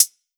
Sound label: Closed Hat, 909; Slate; Vintage Drum Machines Volume 3 909 Edition; 909 Closed Hats